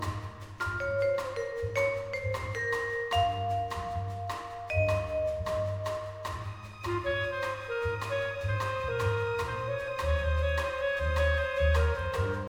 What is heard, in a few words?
Menu Music; Nicktoons Unite; PS2